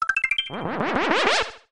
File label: Retry Jingle; Friday Night Funkin' Mario's Madness; PC mod gamerip